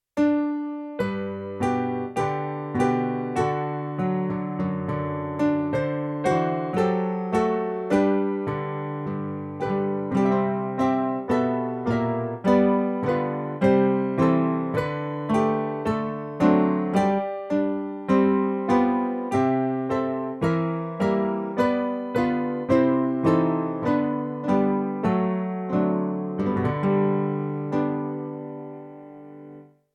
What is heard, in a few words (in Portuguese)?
playback hino